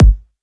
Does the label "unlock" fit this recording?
No